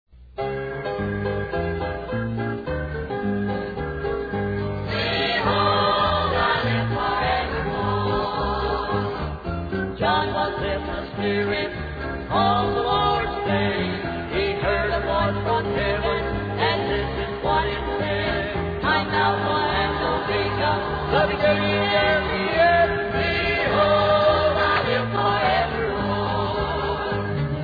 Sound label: choir